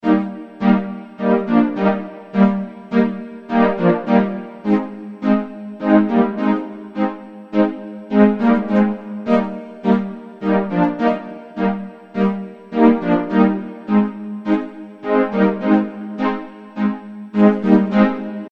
positive music ringtone

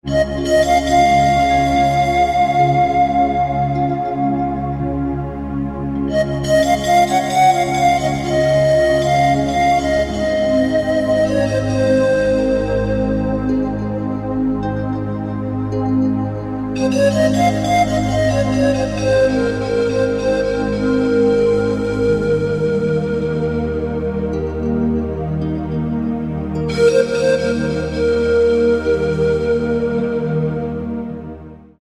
relaxing flute ringtone

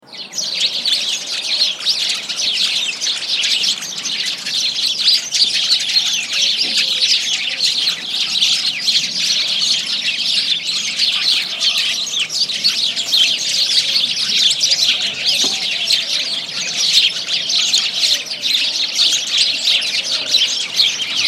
starlings birds ringtone

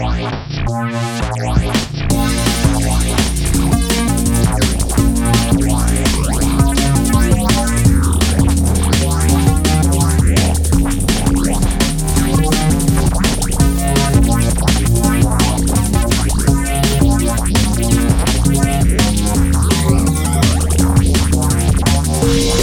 crazy electronic ringtone

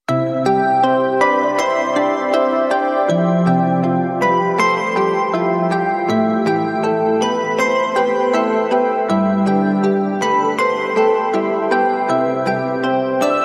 beautiful instrumental ringtone

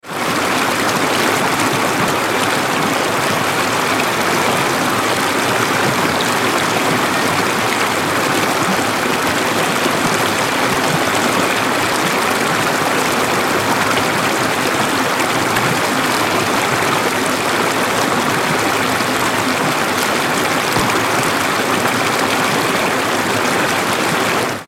water ringtone

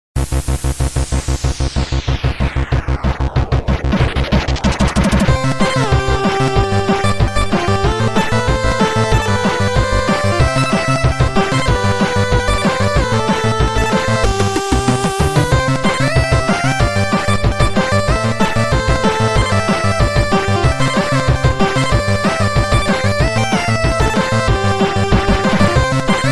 keygen music

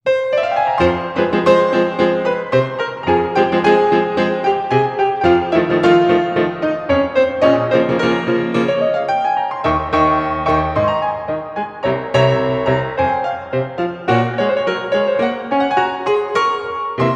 toreador music

